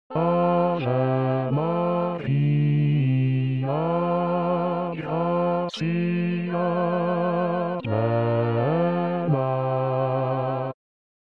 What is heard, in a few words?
Ave Maria Basses; Ave Maria (Arcadelt)